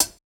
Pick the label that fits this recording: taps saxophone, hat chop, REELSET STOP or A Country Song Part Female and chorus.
hat chop